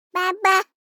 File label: BABIES TALK Boy 12 months Baba; Baby Boom